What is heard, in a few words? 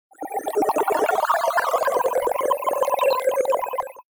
Sci-Fi ringtones